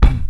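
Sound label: VOX KICK; Northstar - New Gold VOL-2; RAP CITY 1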